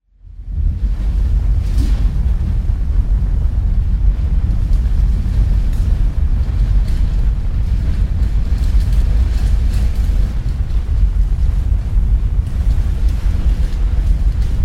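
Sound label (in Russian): общий гул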